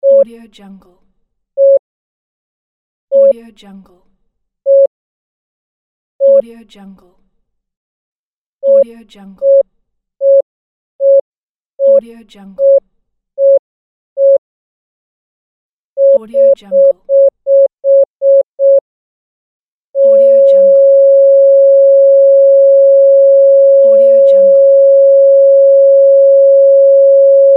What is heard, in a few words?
heart monitor